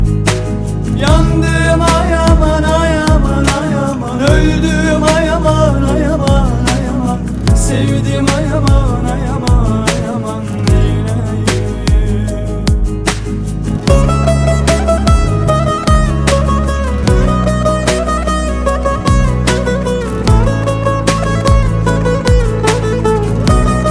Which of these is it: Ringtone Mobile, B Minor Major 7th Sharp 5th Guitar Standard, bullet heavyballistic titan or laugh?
Ringtone Mobile